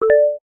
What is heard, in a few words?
hit, keno; games; Bingo